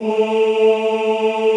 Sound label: OOH A2, Classical Choir; Best Service; Extended Classical Choir